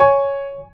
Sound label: C#6 G#6 C#7